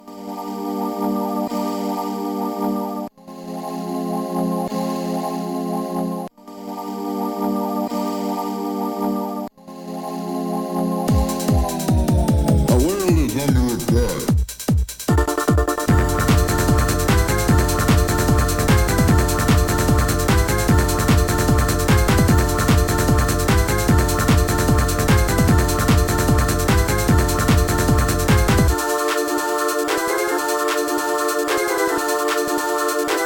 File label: speed techno; Trackers; Modland; Lord Pegasus